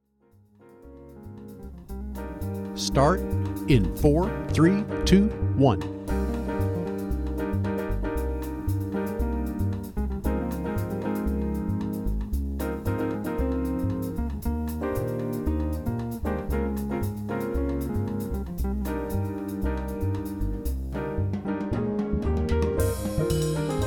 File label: winds